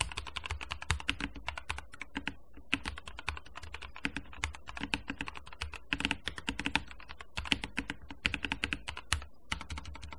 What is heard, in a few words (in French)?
enregistrement son clavier, Corsair K65 Plus Wireless